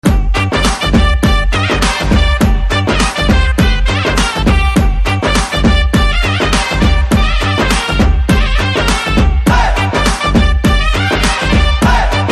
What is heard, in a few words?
BGM Ringtone